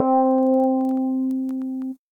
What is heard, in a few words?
Piano & Keys